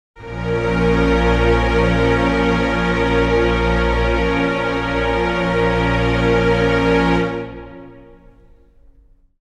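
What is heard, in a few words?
string chord a sharp minor, Pads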